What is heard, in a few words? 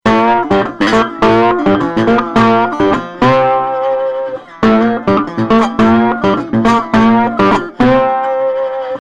Sonic Farm Xcalibur JC Slide Guitar